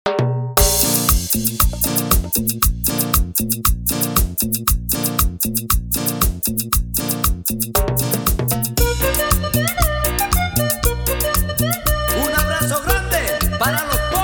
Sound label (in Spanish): Intro Clean, 117 BPM